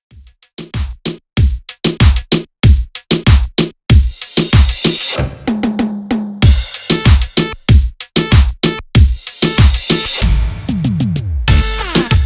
Remix Intro 95 Bpm

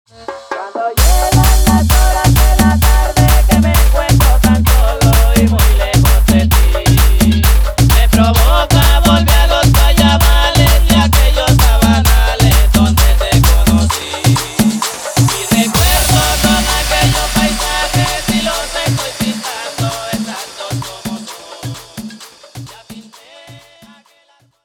intro outro 130 bpm

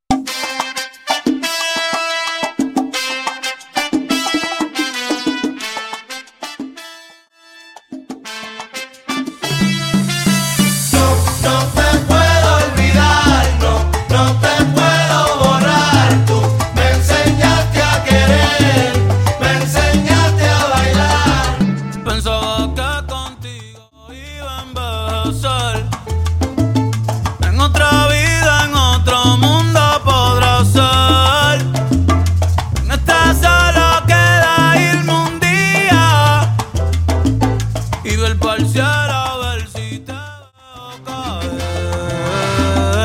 Intro Outro 90 BPM

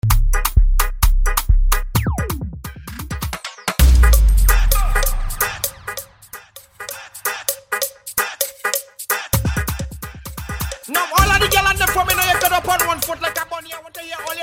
intro outro 130 bpm

